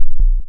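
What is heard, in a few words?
waveform FM sine triangle envelope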